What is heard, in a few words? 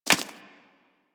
Foley Idle Ear Flap; Enemies; Warframe; Catbrow